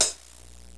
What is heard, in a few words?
CYMB BELL; MUSIC; PERCUSSN